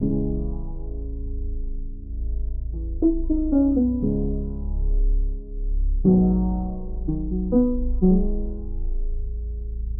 ピアノオクターブ下

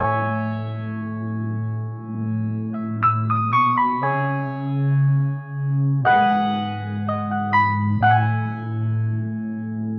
ピアノオクターブ上

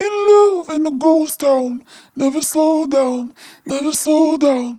速度ダウン

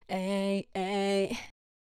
処理無しコーラス

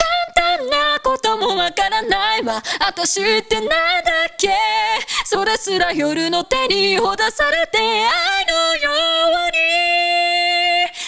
サビ メインボーカル＋オクターブ下

